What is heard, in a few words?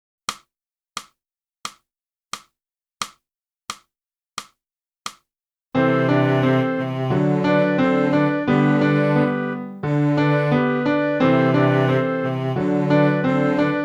flute; Boosey Woodwind Method: Flute (Book 1)